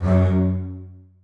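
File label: basses staccato f#2; Sonatina Symphonic Orchestra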